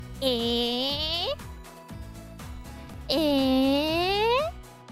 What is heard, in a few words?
eeeeeh eeeeeeeeh